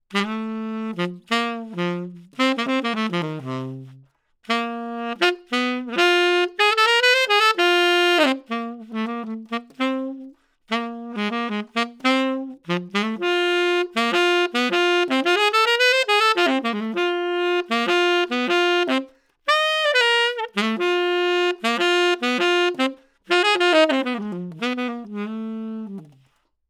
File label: SAX